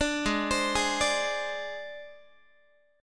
Ab Major Flat 5th Ab Guitar; AbMb5 arpeggiated

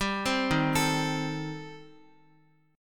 Eb 6th Flat 5th Eb down Guitar; Eb6b5 arpeggiated; JGuitar

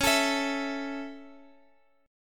Db Minor Sharp 5th, Guitar, Open G

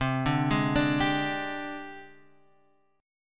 G sharp Major 7th Flat 5th, chord

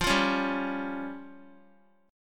F Suspended 2nd Sharp 5th F down Guitar; Fsus2#5 strummed; JGuitar

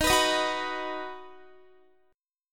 D sharp Minor Sharp 5th D sharp down Guitar; strummed

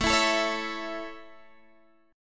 A Major, Guitar, Standard; strummed; JGuitar; x,12,14,14,14,12

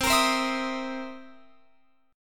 C 7th Sharp 9th C Guitar Open F; C7#9 strummed

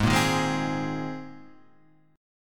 C Minor 7th Sharp 5th, G sharp, chord

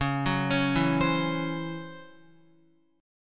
Db Minor Major 7th Double Flat 5th Db down Guitar; 4E,4A,5D,5G,5C,6F; arpeggiated

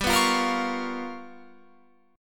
G 7th Sharp 9th Flat 5th, Guitar, Open F; G7#9b5 strummed; JGuitar

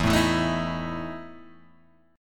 E Major 7th Suspended 4th, Guitar, Open G

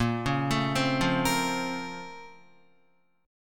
Bb Minor Major 9th, Guitar; BbmM9; arpeggiated; JGuitar

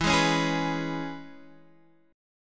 E 6th, down

